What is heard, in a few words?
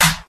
good acoustic snare sample d sharp key; snare drum samples